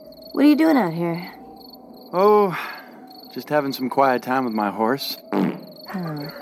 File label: Horse Fart